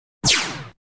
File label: Synth Energy Zap 5, zaps